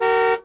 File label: horn, car; Cars; XBOX